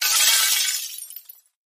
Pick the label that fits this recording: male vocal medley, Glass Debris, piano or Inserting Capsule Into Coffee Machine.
Glass Debris